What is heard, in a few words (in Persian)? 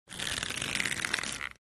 کشیدن زه